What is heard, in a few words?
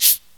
natural shaker hi hat F# key, Royality free shaker sound sample tuned to the F# note; percussion